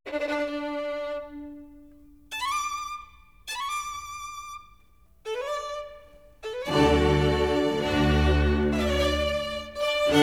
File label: Concerto Grosso. Op. 6. No.5 - Larghetto e staccato, Symphony Orchestra; RCA Victor Symphony Orchestra; STOKOWSKI; HANDEL